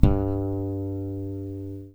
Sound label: DRY BASS, Electric Basses